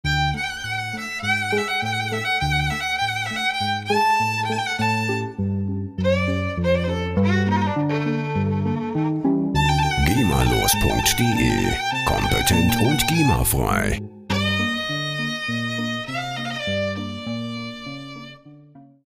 violin

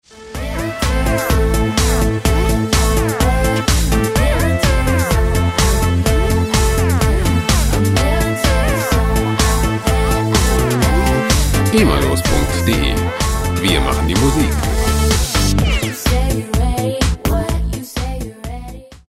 military

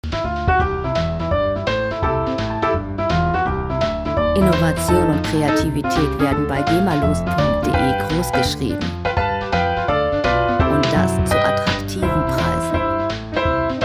jingle bells boogie

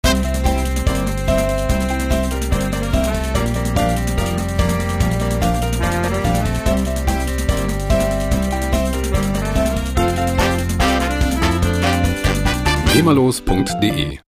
pandeiro

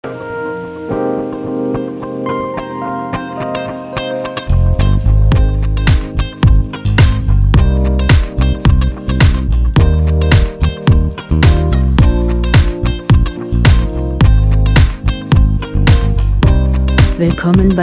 tropical funk

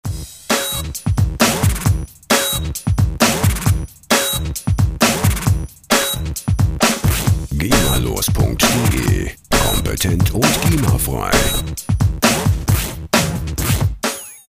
drumloop

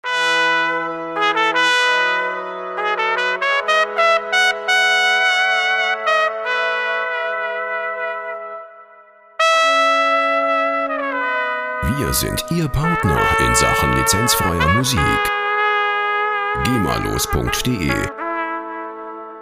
trumpet

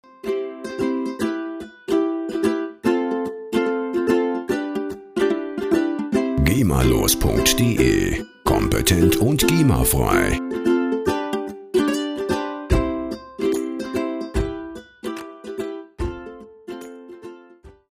ukulele

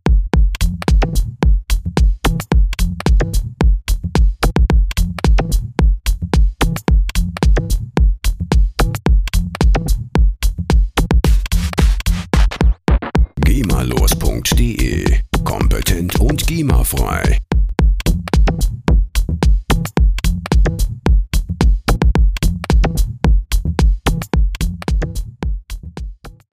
rhythm

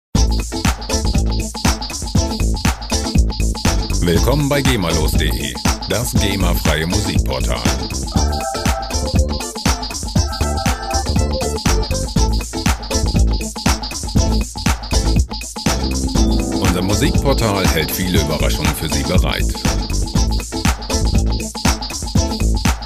funky hype

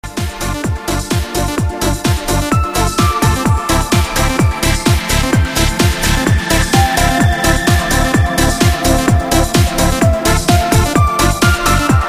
space trance

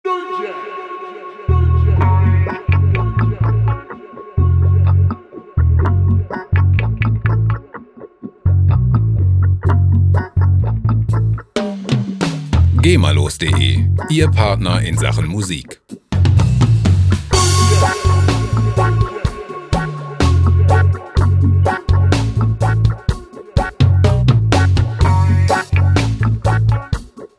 reggae

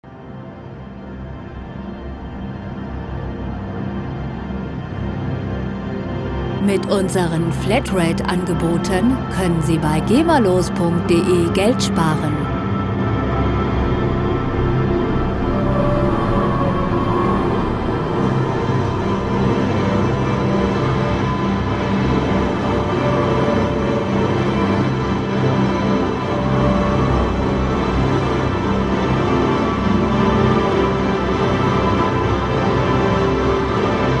acid fog

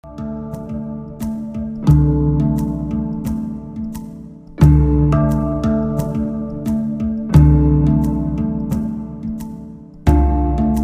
handpan

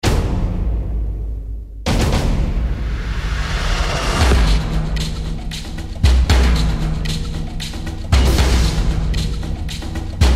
cinematic drums

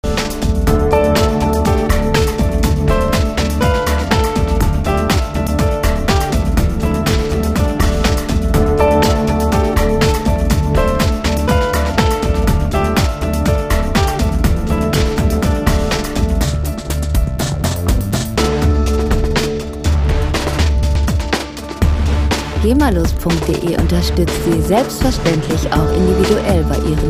phasing impulse

